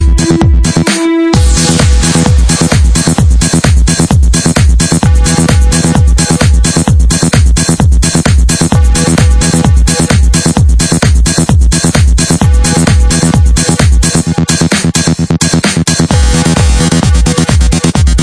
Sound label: instrumental mix, disco